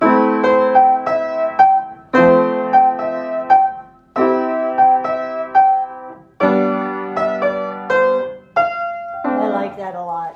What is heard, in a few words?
piano fun